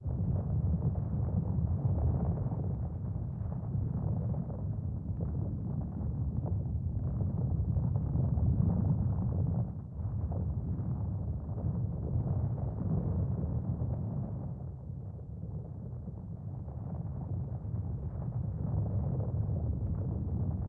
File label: entrance, undead tower